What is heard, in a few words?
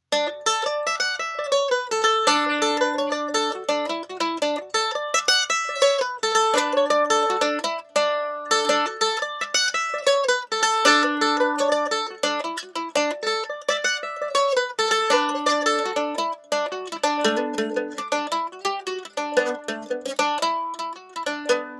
Mandolin Pick Comparison